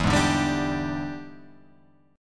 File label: E 7th Sharp 9th, Guitar, Open F; E7#9 strummed; JGuitar